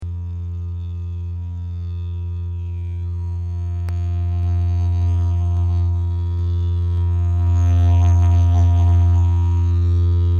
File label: didge